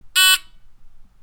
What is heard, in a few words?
kitchen buzzer